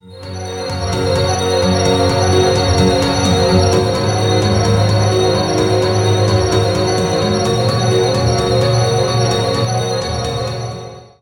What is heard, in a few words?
Office Sms Sound